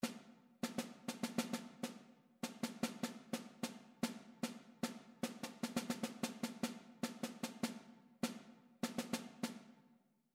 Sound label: metric modulations; ear training